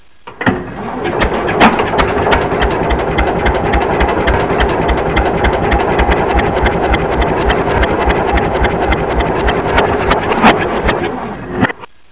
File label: BIPLANE F